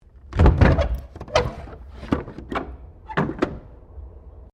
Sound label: Train Carriages 1937 Window Open; Trains; Vehicles